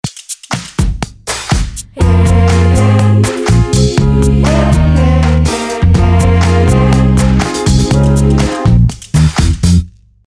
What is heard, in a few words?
jingle